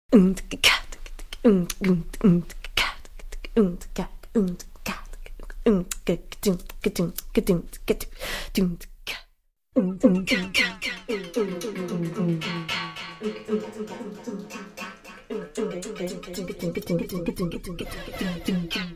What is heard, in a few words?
Female perc loop